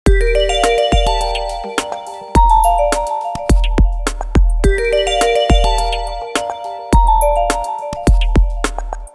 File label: Rhythm, ringtones